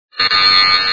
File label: fail; cave